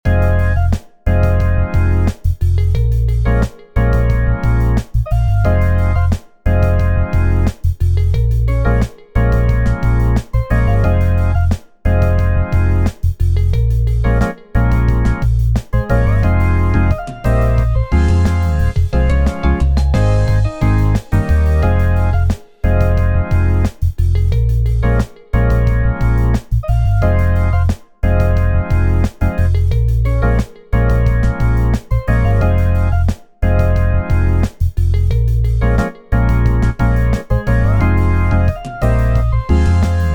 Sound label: beat idea